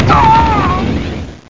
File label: Killed; boomin' eck!